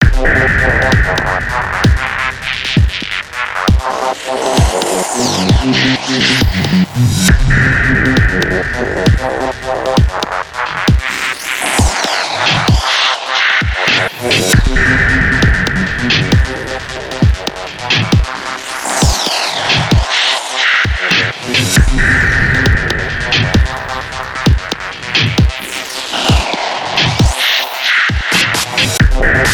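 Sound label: Hunt Ambient Loop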